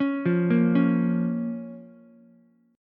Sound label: F Suspended 4th Sharp 5th, down, Ukulele; arpeggiated; JGuitar